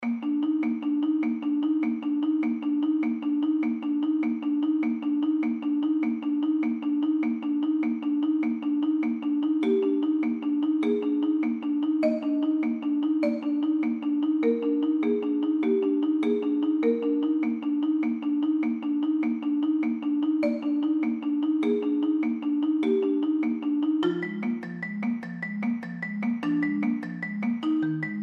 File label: solo marimba